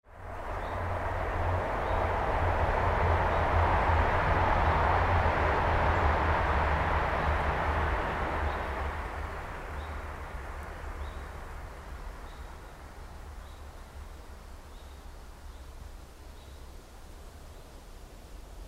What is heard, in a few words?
Foley